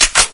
glreload; svencoop; hl2; oicw

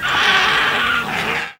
condor speak; svencoop; morass beta

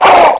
pain; svencoop; scshogo; fallenstrooper

